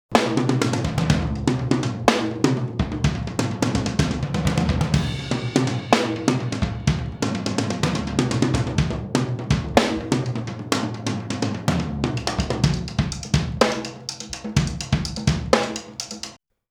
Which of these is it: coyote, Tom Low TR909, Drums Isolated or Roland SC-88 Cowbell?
Drums Isolated